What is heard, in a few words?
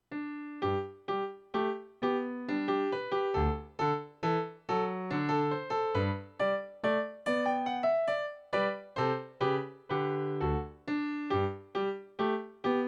Minuet in G; Haydn